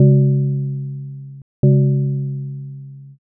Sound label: belltoll